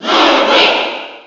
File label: Cheer; Ludwig Koopa; SSB4